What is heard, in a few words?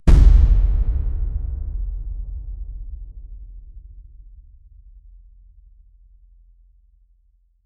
orchestral hammer strike, wooden impact